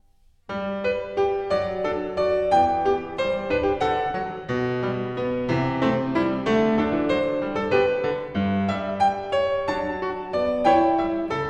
Bach Goldberg Variations BWV988 Variation 4 - L'Istesso Movimento